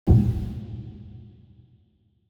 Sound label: Wall Hit; SFX